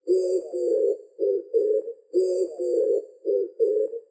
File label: streptopelia orientalis cry